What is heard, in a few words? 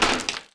Crowbar Wrench; Lara; Playable Tutorial Level